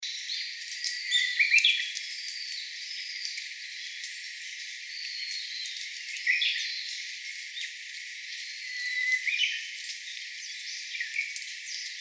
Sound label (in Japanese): uguisu